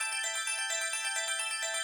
Music Box Wheel Spin; ShowtimePOG; ClubFusion

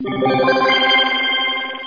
winner; MexicanaChillitime; ClubFusion

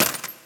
Cash Bag Reel Spin Stop; ShowtimePOG

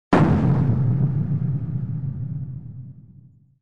Explosion; CosmicCrazyCash; ClubFusion

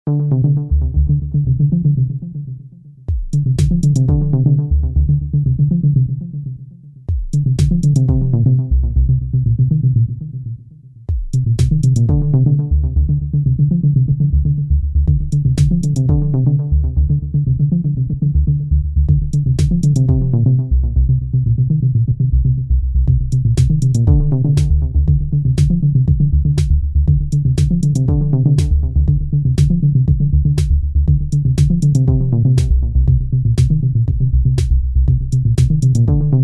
Background; Balls Of Steel

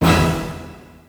Bonus Wheel Symbol; ClubFusion; Showtime Classic

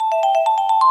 Vibe Win Transfer; ShowtimePOG; ClubFusion

